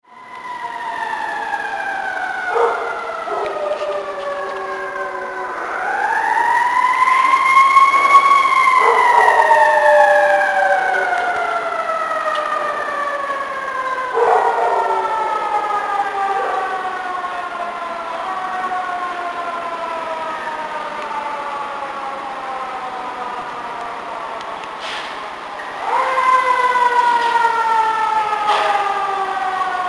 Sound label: howl